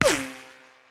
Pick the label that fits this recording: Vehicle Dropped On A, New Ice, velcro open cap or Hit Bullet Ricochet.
Hit Bullet Ricochet